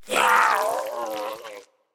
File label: minion death grunt; smite; npc; roman archer